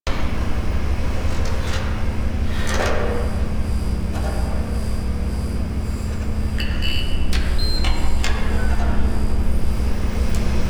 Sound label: Interior Computer Room Bed Loop; Warframe; NineteenNinetyNine